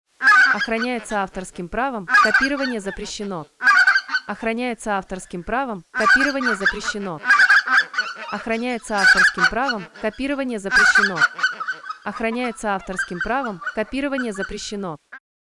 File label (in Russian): белолоб осада стадо, многоголосая осада стаи белолобых гусей; манки